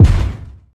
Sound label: wet kick drum c key, bass drum sample tuned to the C note. Loudest frequency: 276Hz